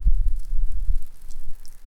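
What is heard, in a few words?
dry tap on concrete pavement, outdoor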